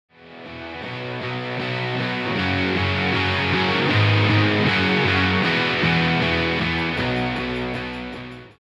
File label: electric guitar without 600hz